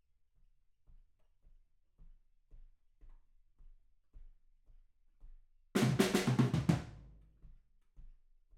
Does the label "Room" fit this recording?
Yes